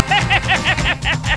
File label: laugh